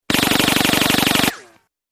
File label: Fast Silenced Machin, silenced